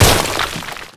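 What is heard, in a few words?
destroy dirt debris, explosions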